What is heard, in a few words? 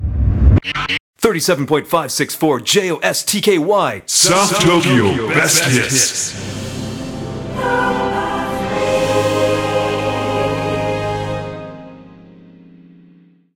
Radio Jingle; let it die